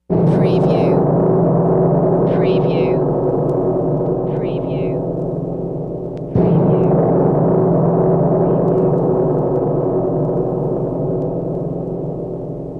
Gong Massive Two Strikes

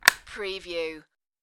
Light Switch

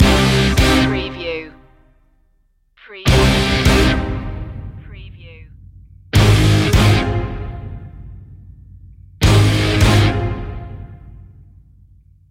drama heavy loop

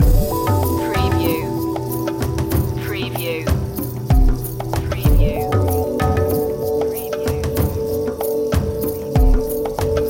asian steppe loop

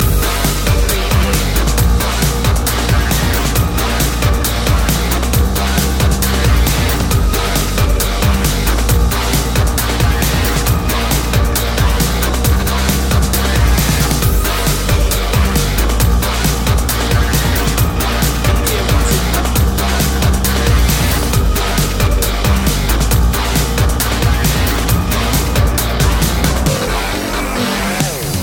cage rage loop

